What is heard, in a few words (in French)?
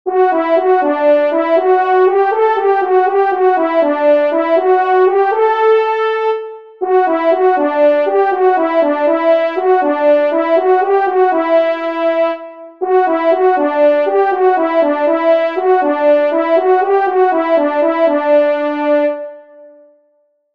Debuche Solo, trompes; Fanfares imposables en Concours